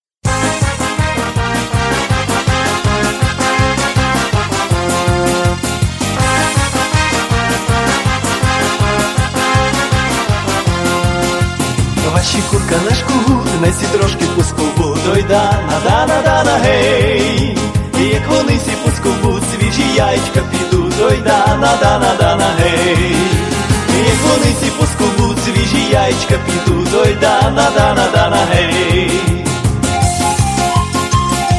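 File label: Ukrainskie svadebnye pesni, Ukrainian wedding songs; Oy dana dana gey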